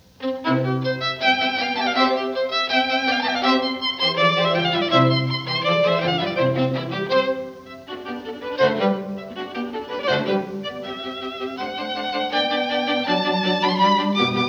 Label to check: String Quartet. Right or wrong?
Right